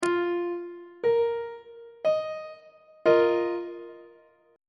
d sharp suspended 2nd 1st inversion on treble clef